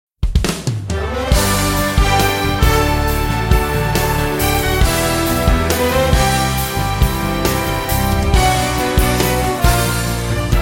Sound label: Bows Instrumental